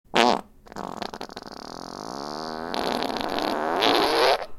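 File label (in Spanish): pedos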